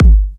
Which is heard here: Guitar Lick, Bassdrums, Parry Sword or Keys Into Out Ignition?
Bassdrums